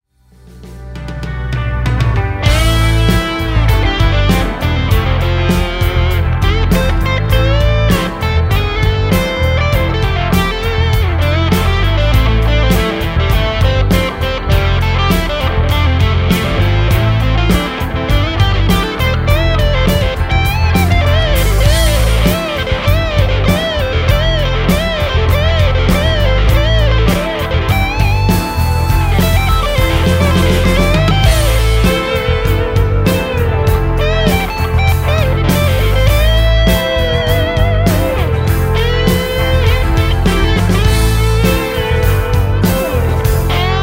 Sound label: strat; mercuriall harlequin